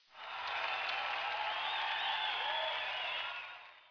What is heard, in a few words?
All cheer